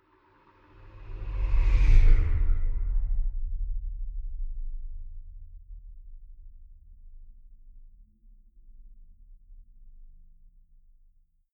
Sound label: spaceship small flyby; raumschiff